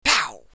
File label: Pow; Assemblee SFX